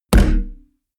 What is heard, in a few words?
heavy saturated damped, hits impacts; whooshes rises